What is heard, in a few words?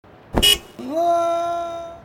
Airbag